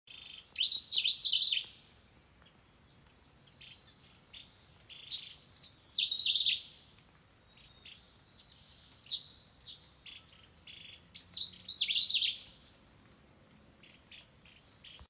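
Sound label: Slaty bellied tesia, bird; تسک شکم توسی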